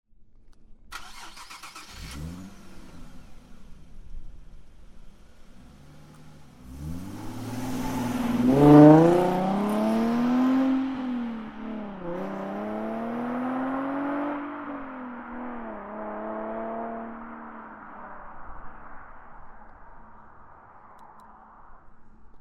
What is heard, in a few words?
Start